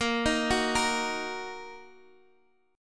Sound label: A sharp Suspended 4th Sharp 5th A sharp, Guitar, Open D